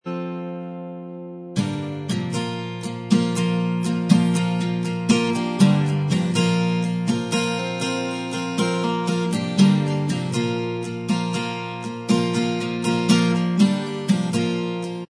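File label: acoustic style instrumental track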